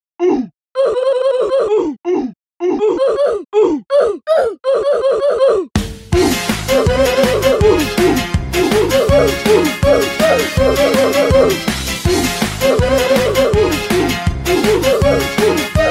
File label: oof